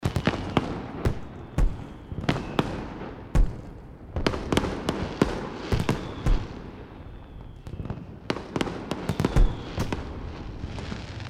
Fireworks; Minecraft Chinese Edition; Android iOS macOS Windows gamerip 2017

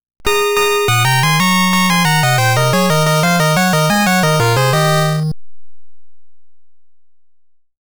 Stage Clear

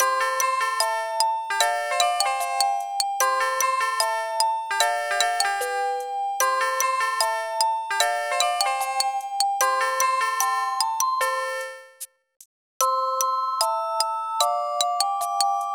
Music Transitions; Candace Kane's Candy Factory; NDS

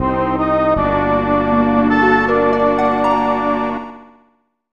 Unused Fanfare; Gokinjo Bouken Tai; snes

